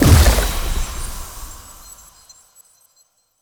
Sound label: Stomp Impact; Powers; Fey; Founders Champion